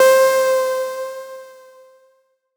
One Shot - C, Synth; Cymatics; Light Free Sample Pack; Bonus Samples

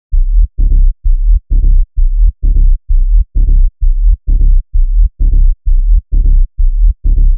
Bass Loop 130 B, Techno; Ghosthack; Free Techno Sounds 2024

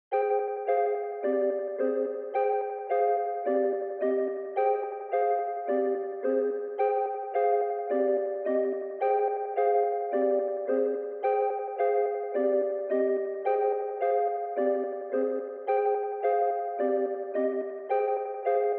108 BPM A Min Mellotron, loop stems; Melody; Cymatics; 2022 Melody Collection; Wavy; Space Invader

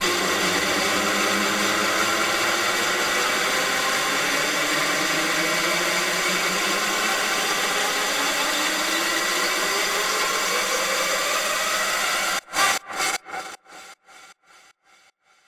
riser oneshot, FX; GhostHack

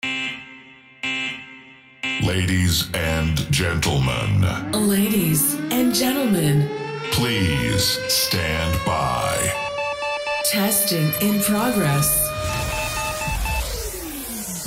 Intro, Techno; FrechdaXradio; TechnoLIVE

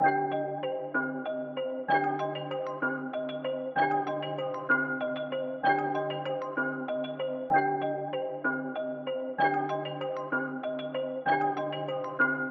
Dark Melody Loop - 128 BPM C Min Bell; Loop Stems; Cymatics; 2020 Melody Collection

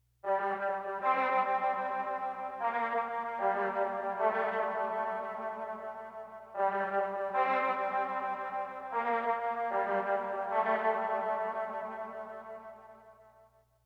Dark Melody Loop - 152 BPM D Min Horn; Loop Stems; Cymatics; 2020 Melody Collection

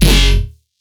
Riddim Bass Hit F#; Tronics

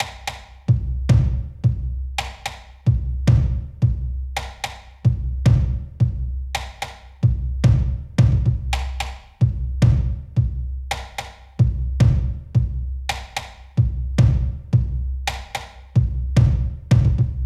Bassdrum - 110BPM; Percussion Kits; Afterlife

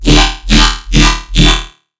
Riddim Bass Hit E; Trench

